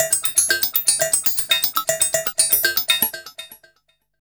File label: Industrial Loops; 119 YORK